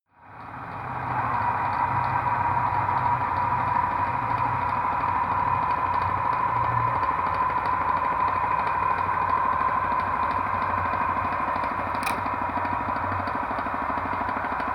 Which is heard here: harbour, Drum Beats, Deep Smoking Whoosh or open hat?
harbour